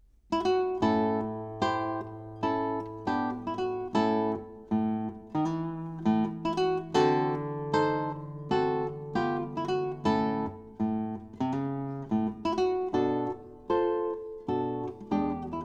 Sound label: Blues for Classic Guitar, Spanish Guitar